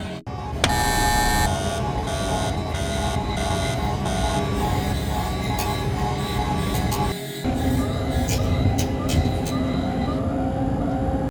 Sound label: alarm chernobyl